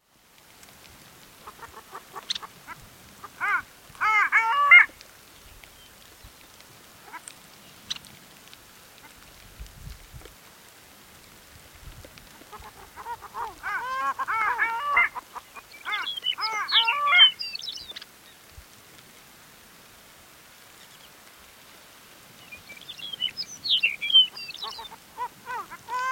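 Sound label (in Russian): moryanka golos, long-tailed duck voice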